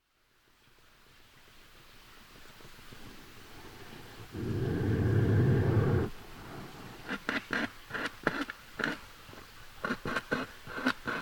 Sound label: beaver mumblings